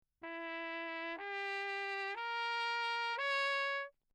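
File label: trumpet mute; Perinétventiltrompete; Lechner; Engemann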